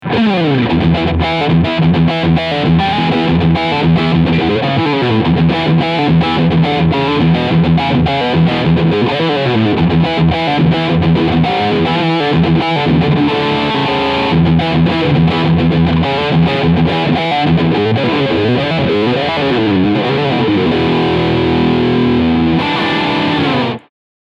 Peavey HP2 Riffsound Steg Pickup

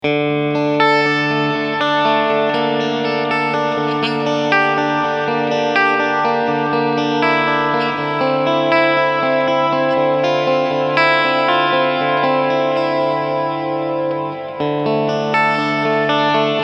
Hall Variation

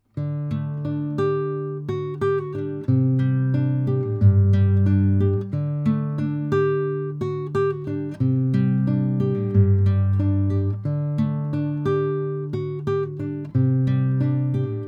SPL Channel One MKIII Klassikgitarre R1

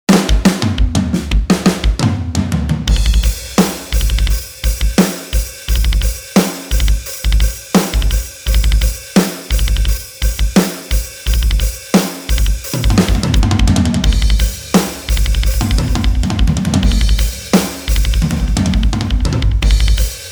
Stil Metal

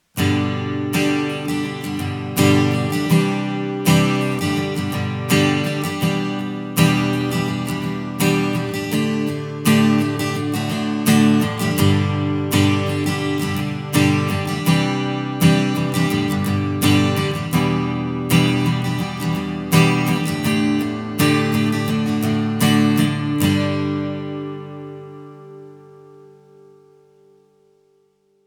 universal audio bock 187 akustikgitarre

